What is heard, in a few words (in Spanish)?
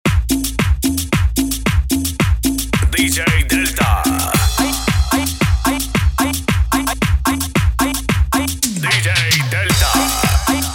Cuarteto, intro simple